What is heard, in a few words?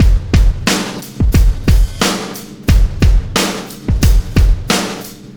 Landing Party Beat, Processed Drumkits